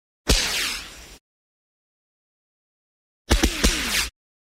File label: gun